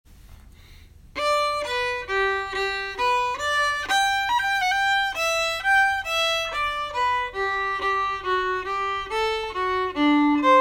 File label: lilting fisherman slow